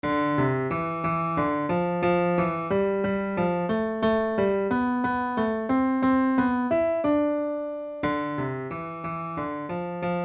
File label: trichord type3